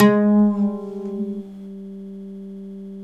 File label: OUD GLS DWN G, Mediteranean Traditions